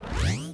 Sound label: droid servo